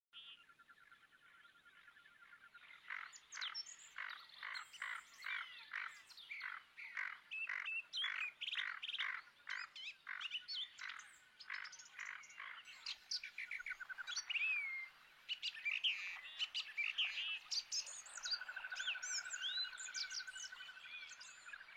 gogol obyknovennyj bucephala clangula common goldeneye; film studio aves